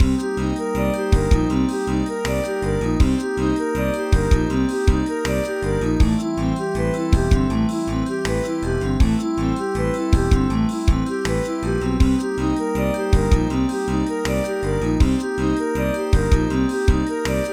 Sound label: BGM Failure; Grand Trucker Aniki: Shigoto to Kenka to Koi Moyou